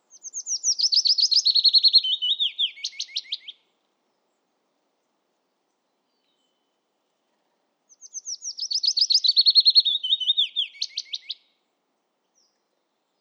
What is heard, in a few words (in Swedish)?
lovsangare Phylloscopus trochilus sang